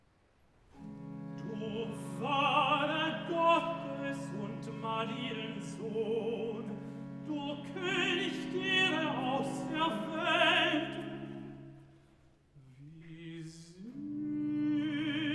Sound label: Cantata, Recitativo (Tenor); BWV 1 'Wie schön leuchtet der Morgenstern'; Bach; Walpole St Peter